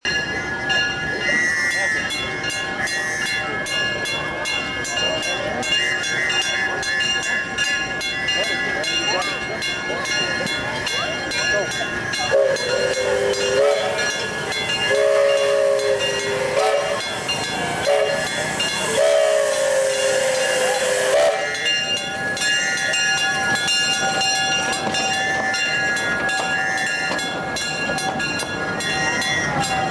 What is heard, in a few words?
Thresheree bell; Wisconsin; Edgerton; Thresherman